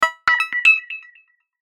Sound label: CHIME SYNTH RHYTHMIC HIGH; Blastwave